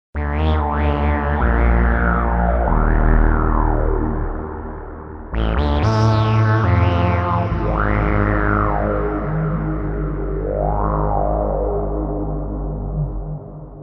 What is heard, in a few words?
Dungeon; TURBO VL